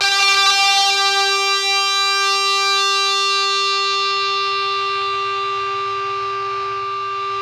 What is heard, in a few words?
ZWEEP, Synthy Tonal; Distored Reality